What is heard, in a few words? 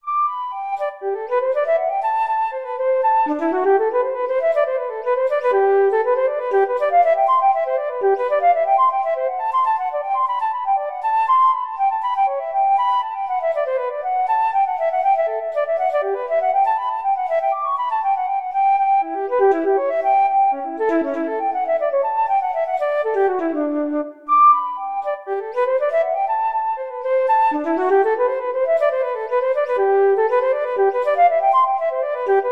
gigue, flute